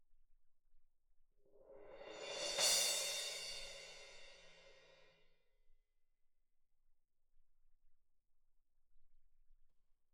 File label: Perc